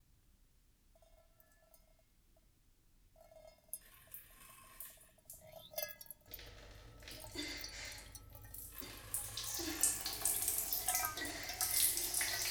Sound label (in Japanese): お風呂場 同時